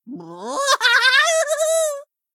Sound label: laugh; svencoop; gruntsparty; psychward